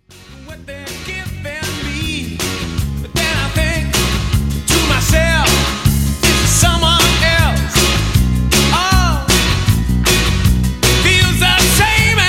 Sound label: kick kick